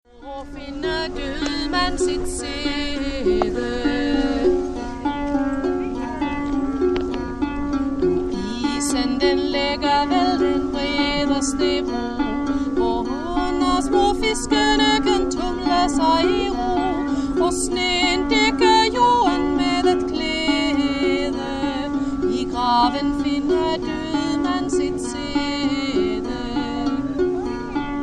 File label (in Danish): vikingemusik og sang; vikingemarked